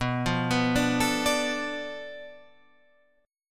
B Minor 7th Flat 5th B

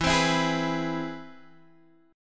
C Augmented Major 7th E

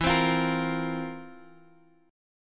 F Major 7th Suspended 4th E

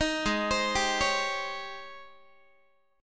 G sharp Major 11th, Guitar; G#M11 Chord

